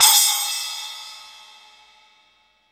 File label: SPLASH, Drum; East Collexion; Drum 1 Dry